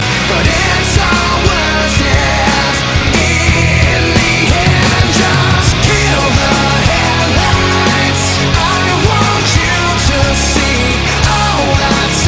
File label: Ringtone